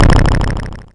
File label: snr; Mono